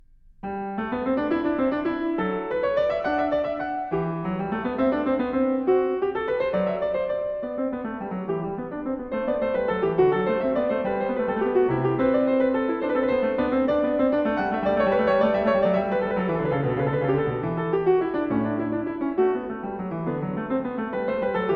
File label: Variatio 27 a 2 Clav Canone alla Nona, Goldberg Variations; The Open Goldberg Variations